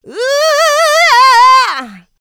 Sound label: Vocals, Adlibs; Houseworx